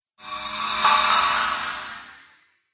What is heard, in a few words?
Magical Impact Accent; Magic Sound Effects